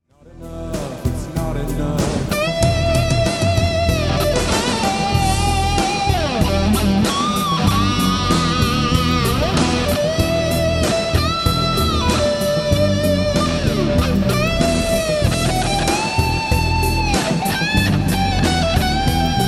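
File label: Solo Big Muff GE7 Mesa Bassman; Mesa (EQin)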